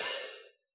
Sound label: hi-hat open, Yamaha DJX Rock Kit